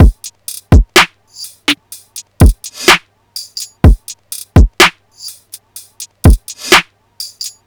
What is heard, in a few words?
Drum Loop 125 BPM; Drum Loops; Smack Drum Loop; Cymatics; Cymatics - King Drum Loops (Teaser)